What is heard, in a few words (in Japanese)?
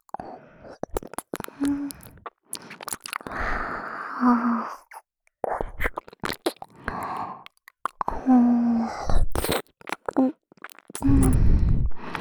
あぐ…ぁーぐ…♪, ASMR, 左耳